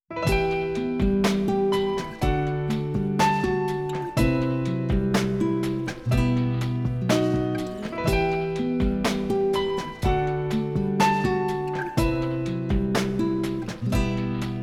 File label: off vocal